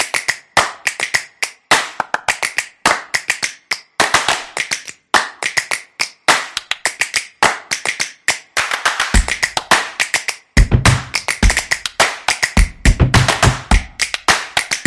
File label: Clap Your Hands, percussive; percussive nation vol 2